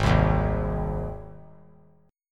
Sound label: Ab Major Ab down; strummed; JGuitar